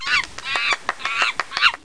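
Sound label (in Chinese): yazi jiaosheng, duck quacking; jiachu jiaosheng; jiaqing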